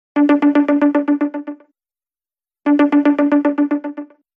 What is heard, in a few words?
crystals ringtone; i phone x